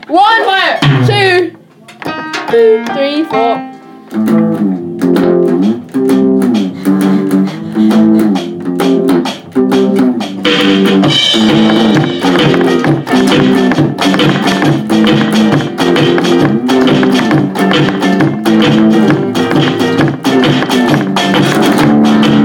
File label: main riff